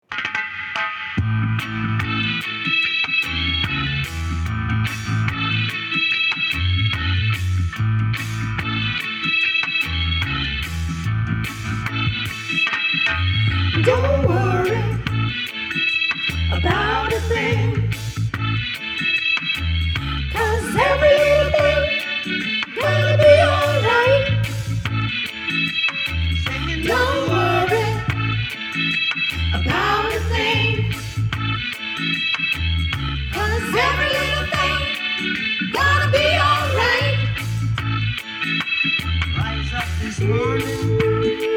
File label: soprano